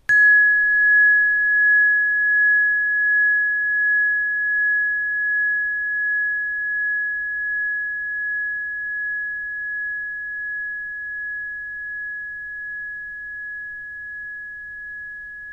TRIGON 12 synodischer Mond

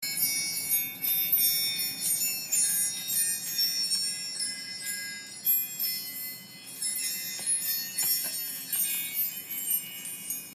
Kosmos Klangkugel

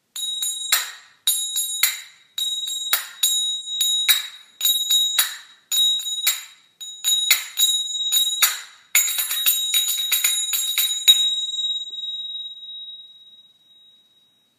Rhythmuszimbeln

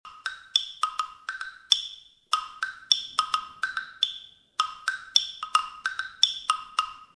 Dreizack

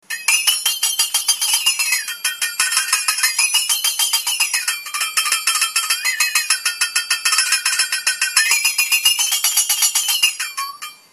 Flexaton einfach

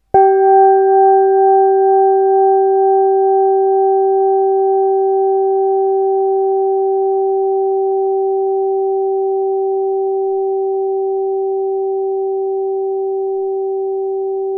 GONG OBLONG; Synodischer Tag

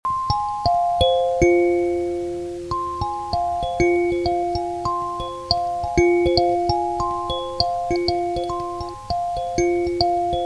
9-Ton Taschen-Kalimba

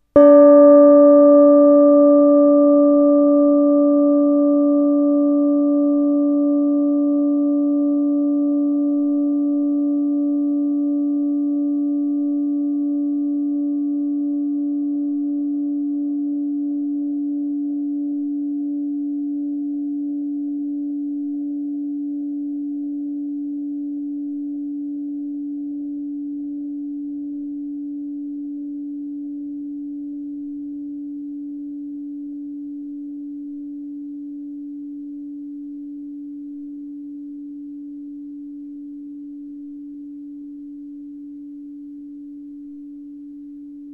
GONG OBLONG; Mars

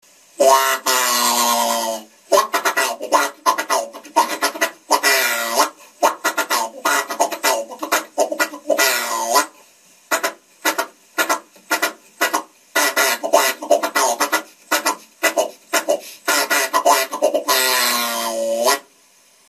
Entenstimme

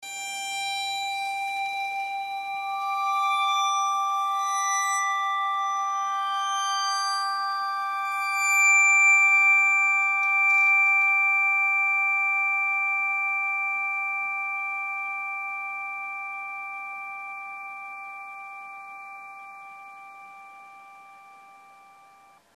Metallharfe

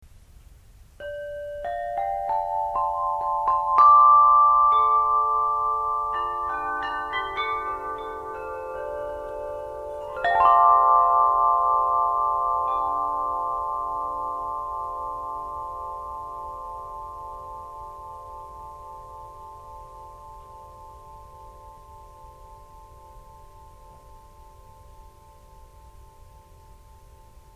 Roehrenglockenspiel

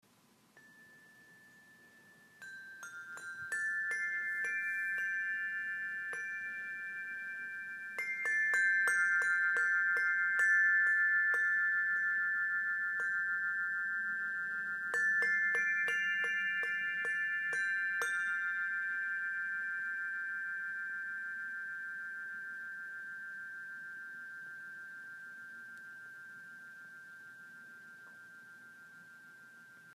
Rohrenglockenspiel Klein

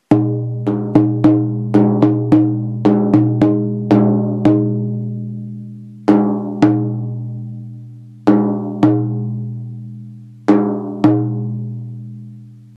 Schamanentrommel gross

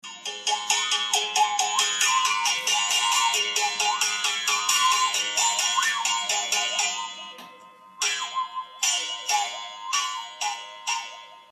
Mundbogen 90cm

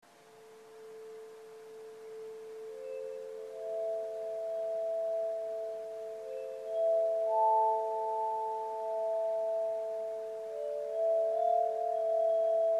Glasrohrenspiel

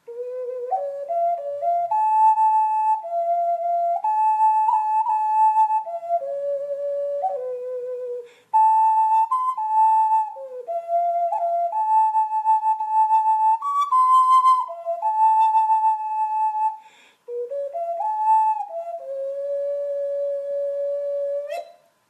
6 Loch Okarina

